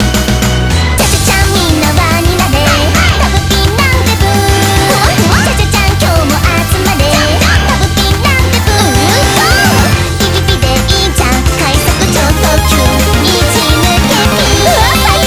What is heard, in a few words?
BGM Reach